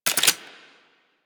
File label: Laser Aim Rifle Auto Switch, Weapons; Grineer